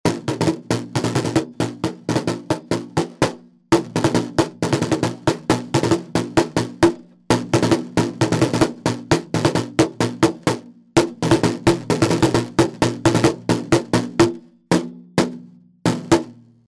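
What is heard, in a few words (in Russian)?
pionerskiy baraban, pioneer drum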